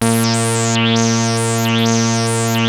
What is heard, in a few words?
2600 A#3, ARP 2600 Synthesizer; Hollywood Edge - Giorgio Moroder Rare Synthesizer Collection